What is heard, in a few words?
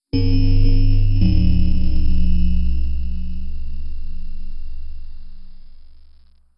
Windows PDA Shutdown; Windows PDA Neptune Shutdown; Windows Never Released Soundpack 2